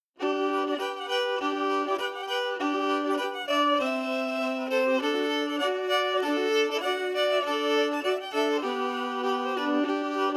Reel harmony emphasized; Eddies Reel

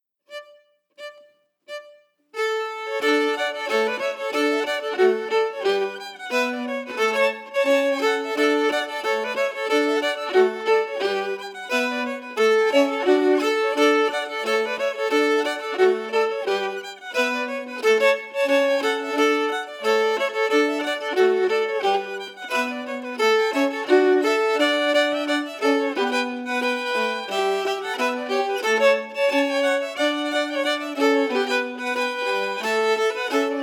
Reel harmonies; Reel de St. Joseph

